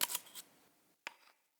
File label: Undig Pickup Acorn; Cinematics; Bromius; Bromius Quest Acorn